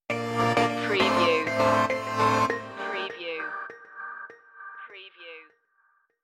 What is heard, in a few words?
funky poptronic stinger